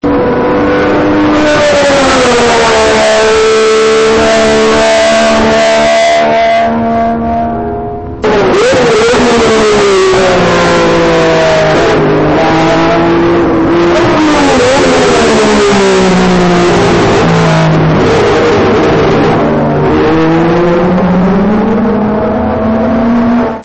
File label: ferrari